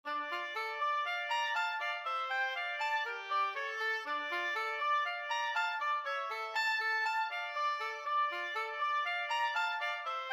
oboe duet; Dvořák, Antonín - Suite Op. 98, No. V, opening

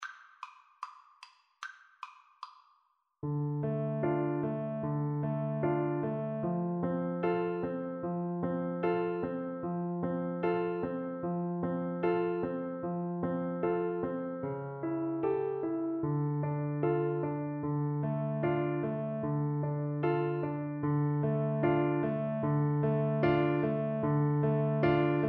el condor passa, Bassoon

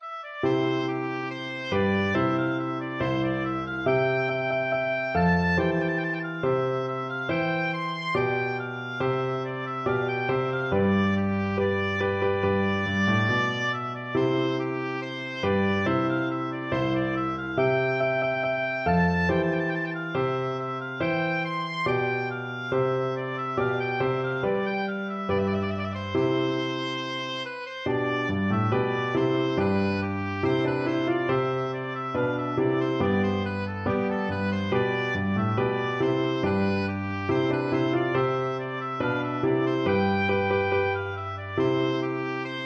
oboe

